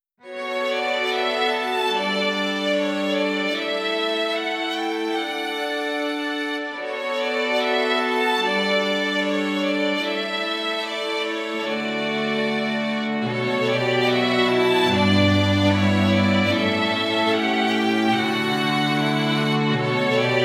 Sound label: strings